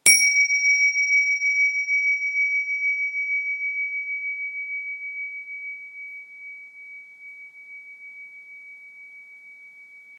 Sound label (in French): Cymbales 7cm signes auspicieux